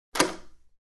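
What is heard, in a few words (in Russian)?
звуки дверей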